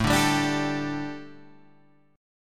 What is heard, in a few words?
A 7th Suspended 4th A, chord; A7sus4 strummed